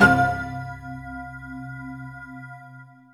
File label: IMPACT; Back In Time Records; Big Bang; TDP+BELLS2